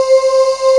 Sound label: BREATH VOX; ANA SYN; SAMPLE WAVE MIXING 1